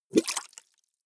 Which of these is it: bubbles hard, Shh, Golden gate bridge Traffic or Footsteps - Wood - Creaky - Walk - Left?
bubbles hard